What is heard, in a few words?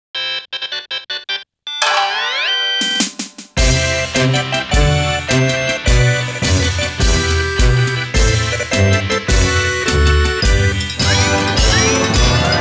Sogo BGM; Taiko no Tatsujin 8 2006; PS2